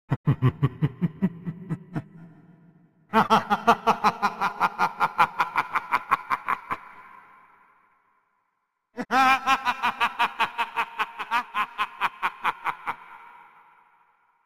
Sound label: laughter; halloween